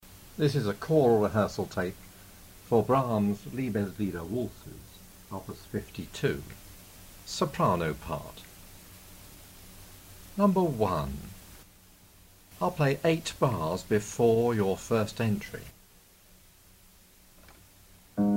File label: Lieb Sop, soprano; brahms; liebeslieder